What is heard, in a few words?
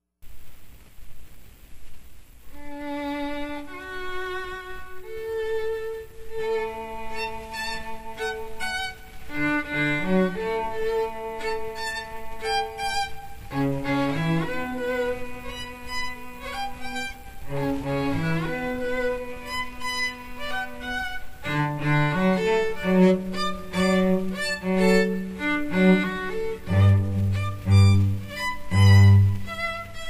strings